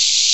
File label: parasite pain; Wanted